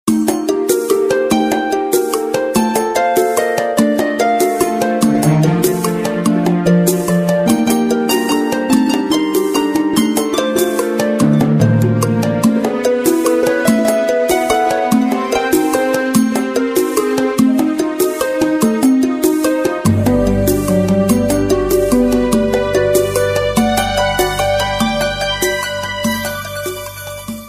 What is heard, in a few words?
Tribal Soul